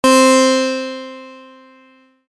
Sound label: NH harpsichord